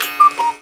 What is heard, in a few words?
Night Jingle; Style Savvy Trendsetters; Nintendo 3DS